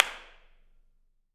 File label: impulse